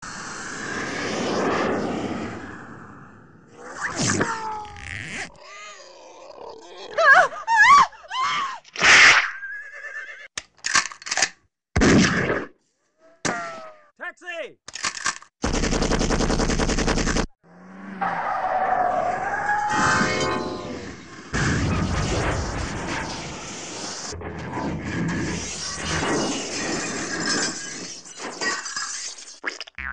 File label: Sound Effects; THX